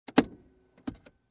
Locking in place

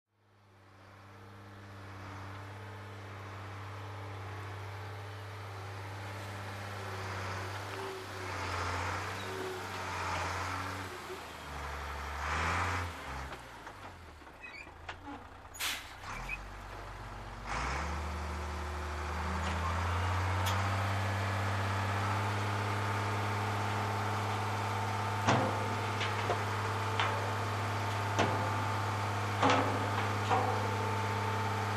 Garbage truck incoming dumping

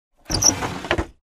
Opening Sliding Door

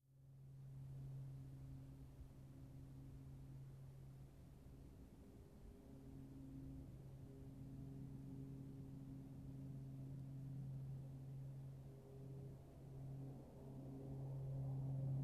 Aircraft Flying by Far

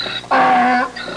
Donkey bray sound

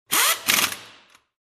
Heavy Driller Revving sound

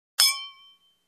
Wine Glass clinking

